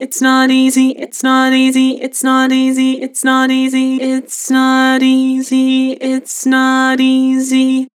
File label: Vocals Flat Its not easy; Harmor